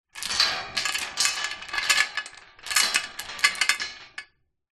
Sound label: industrial chain around metal fence movement; zapsplat